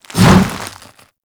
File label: Infested Charger Leap